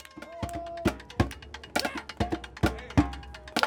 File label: Chanting sound